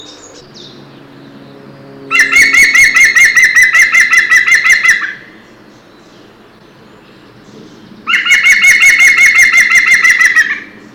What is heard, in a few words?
Green Woodpecker male